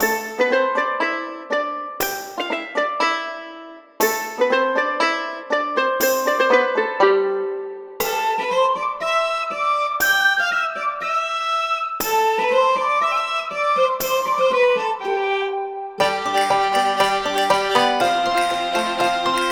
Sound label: tavern; red fox